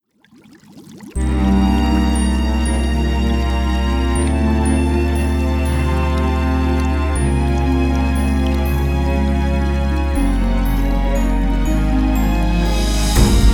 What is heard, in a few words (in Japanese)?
失われた海底都市